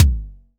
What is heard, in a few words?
KIK, Pearl DRX-1